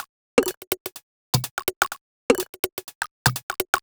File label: Top C 125bpm, House Drumloops